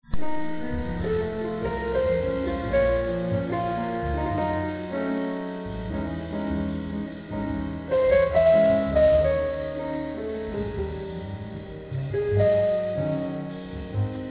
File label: trio, kammarjazz; kammarjazz i kyrkan